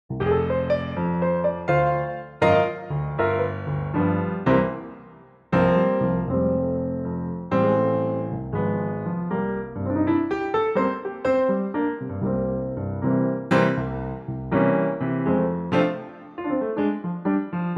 F blues piano; key - F - vocal range - C to C; Bluesy piano only 4/4 version of "Happy Birthday".

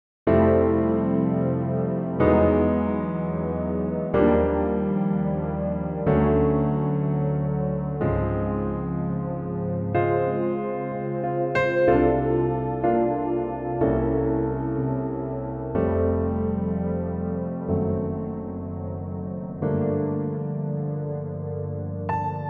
F pad Piano

